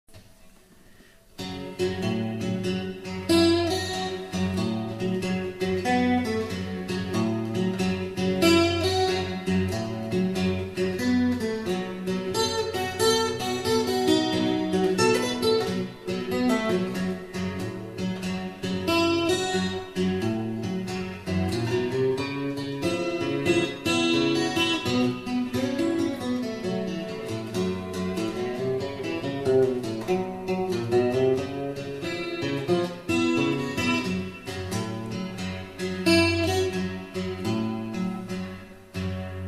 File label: delta blues fingerstyle